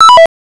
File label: miss